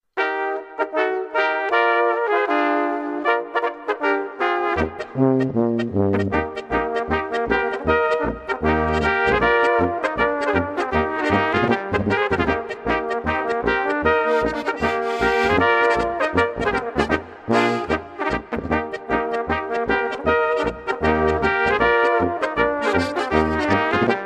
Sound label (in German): frueh morgens polka